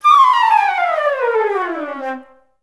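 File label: flute run down D4; Best Service; Peter Siedlaczek's Advanced Orchestra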